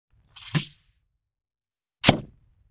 Sloop Poink, Comedy Vocals; Vintage Cartoon

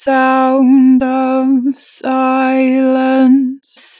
Natural Vibrato, Vocals, 2 bar vib; Harmor Vocals

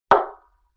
Barrel Hit Medium, Vintage Cartoon; Drums & Percussion

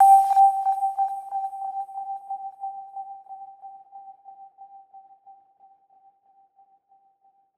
FX Sonar

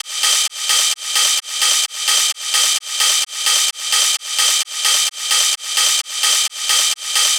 Ride Loop 130, Techno; Techno Sounds 2024; Rides

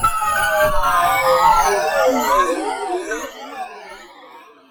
Overhanging Downlifter, Dubstep; One-Shots; Effects; Ghosthack; Dubstep Sounds 2025

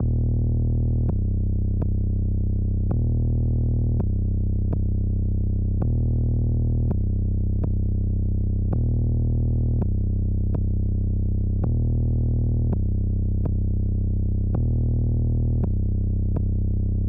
165 BPM G Min Bass; Melody; Loop Stems; Cymatics; 2022 Melody Collection - Wavy; Painless

